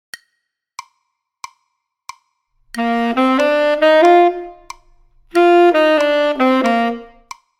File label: Tenor Class Example E, Saxophone; Example 21e; Master Class #21 -- Playing By Ear; Recordings For Beginning Jazz Saxophone Studies for Tenor, Volume 2